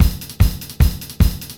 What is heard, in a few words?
SPE BEAT2, Roots Disco; USB Soundscan vol.32; AKAI